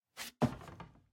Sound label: Footstep Lab Rotate